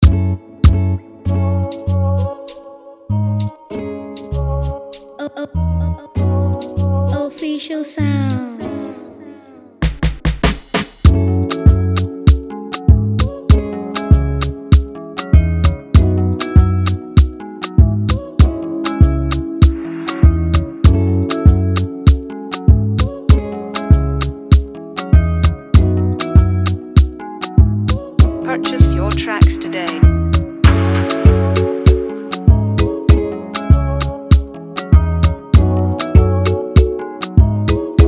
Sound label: Afrobeat Instrumental; Afropop beat